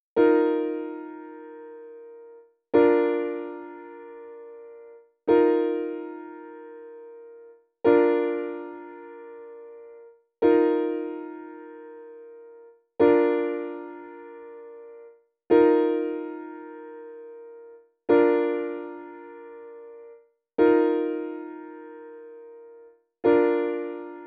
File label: song piano